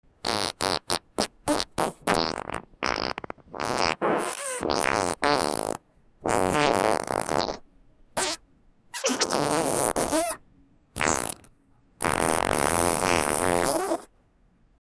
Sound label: futuristic scratching bub; amusing